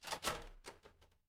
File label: door lock